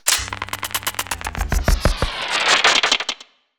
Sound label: Sci-fi Malfunction Rumble